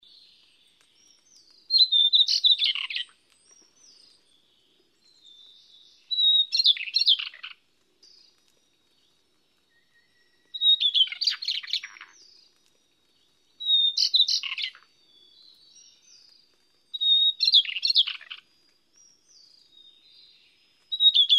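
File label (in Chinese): 小翼鶇, Yushan National Park; 鶇科/留鳥; 藍短翅鶇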